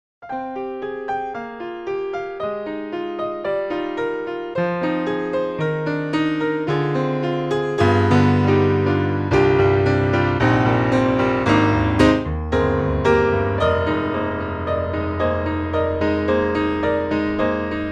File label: grand C7 Player, piano